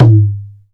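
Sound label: Ethnic Perc, drum; Percussion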